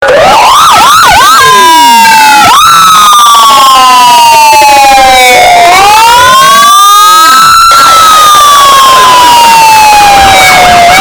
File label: earraped siren